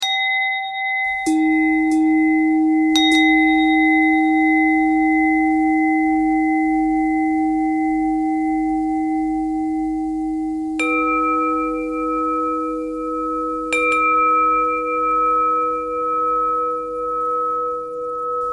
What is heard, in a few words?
bell bell thread noise